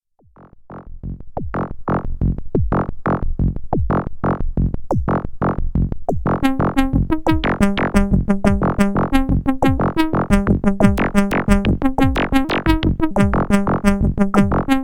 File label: arp2600 fx three Sounds